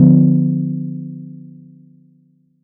Chord; ModelCycles

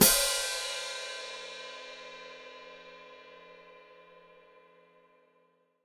Hat Closed Open, Hi-Hat

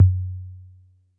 G2 Analog Tom, Dance Kit

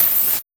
Sweep Snare; Sonic Foundry (Sony Creative Software); Esoterik Beatz; Sweep Kit

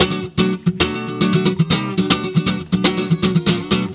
GUIT GROOVE; Best Service; World Colours

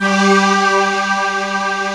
VOICE, HOUSE

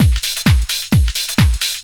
drum 130 groove; Techno Trance Essentials

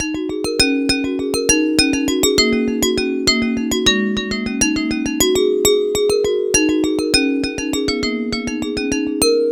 Fast Glass

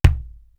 LO WOOD; East West - Global Sounds; D4 BD SD TOM

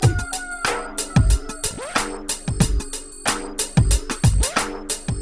PHATT BEA

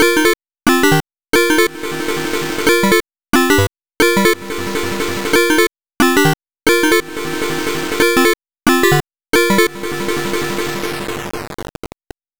Intro Outro Chip Lead

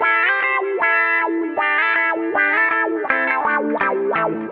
GUITAR

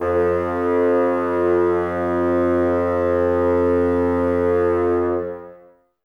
Bassoons F 2; Miroslav Vitous - Woodwind Brass Ensemble

